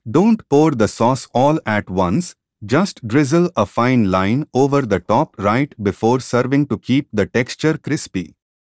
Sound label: fizzle sizzle drizzle C 4 hat